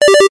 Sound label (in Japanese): ポーズ レトロ, famicom system